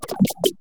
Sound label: bite head